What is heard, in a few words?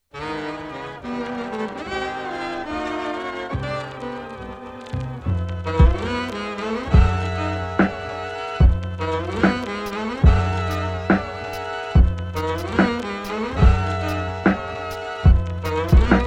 jazz